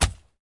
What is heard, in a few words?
squish